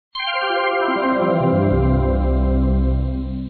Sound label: Next Level; block buster